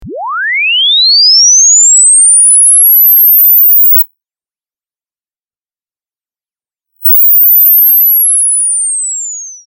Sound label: sine sweep